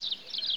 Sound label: bird